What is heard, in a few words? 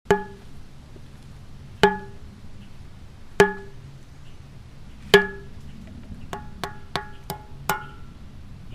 Scary Pitch Hits